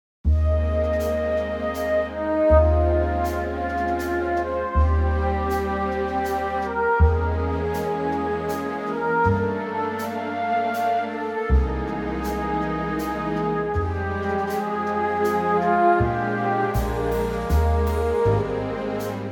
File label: orchestral; Ab